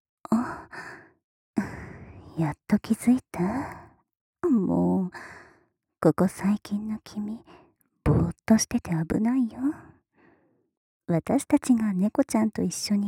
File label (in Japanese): 唾液たっぷりぐちゅぐちゅキス; 効果音あり; 灌水